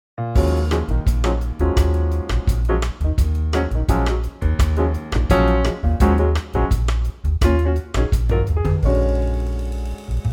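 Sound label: Eb minor trio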